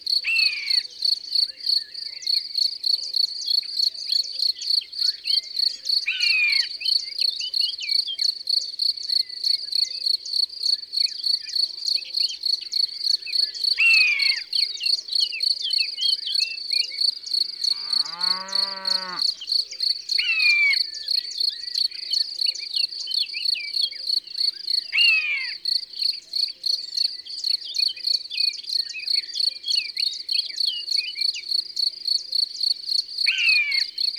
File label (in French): Buse variable; ABC de la nature